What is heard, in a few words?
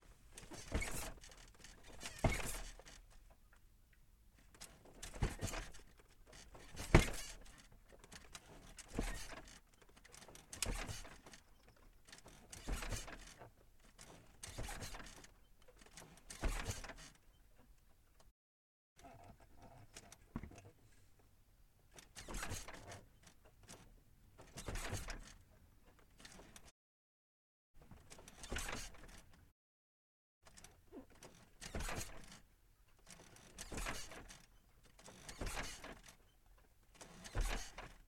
Polaris Sportsman 500 - Suspension Movement - EXHAUST; Polaris Sportsman 500 XP 2006; DPA4062; Pole Position